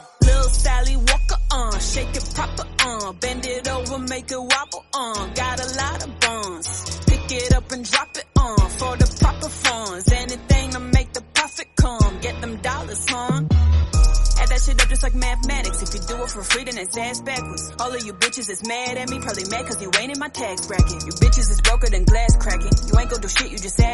free hip hop song tone ringtone; nokia ringtones

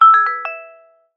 oppo find x5 lite sms ringtone

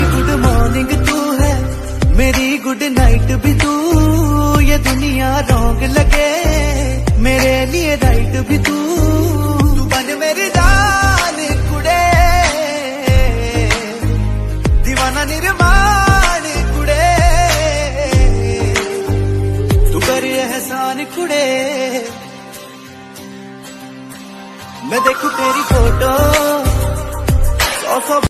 bollywood ringtone

